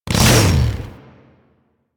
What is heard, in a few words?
Saw Melee Swing; Weapons; Warframe; Grn Ghoul Saw Player